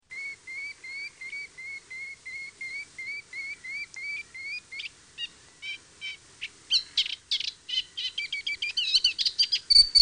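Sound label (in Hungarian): Fülemülesitke; Madarak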